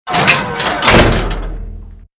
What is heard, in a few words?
door close